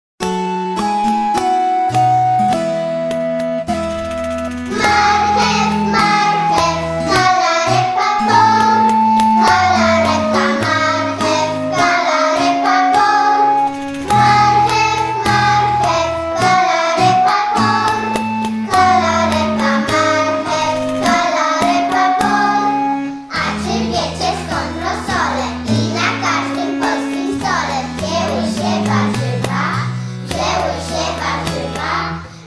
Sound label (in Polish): wersja wokalna